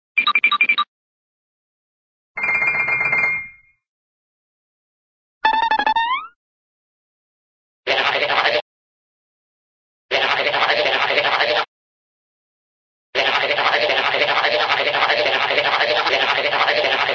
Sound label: head shake, cartoon xylo head shake